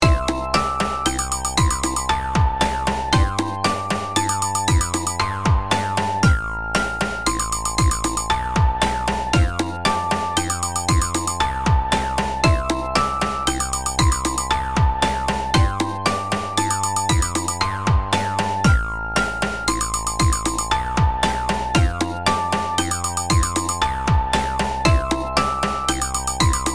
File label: Background Music, Instrumental